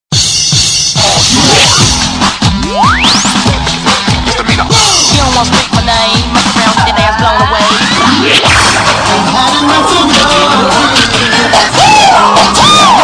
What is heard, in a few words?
Cheer Remix